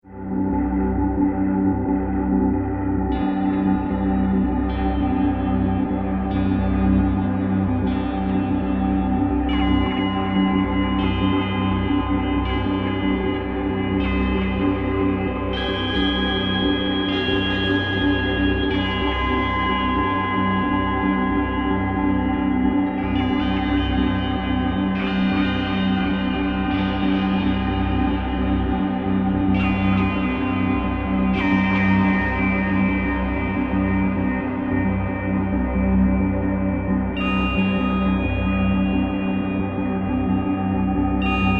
Drone SciFi Musical Tonal Mystical, Drone Scifi Musical Tonal Ethereal Mystical Evolving Atmosphere; Sound Design; Beds